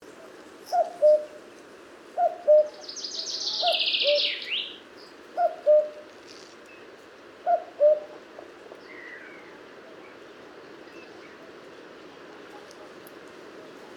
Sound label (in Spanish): Cuco común - Cuculus canorus; ocells